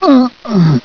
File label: die